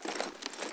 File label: bazooka reload get rocket, weapons; svencoop; ww2projekt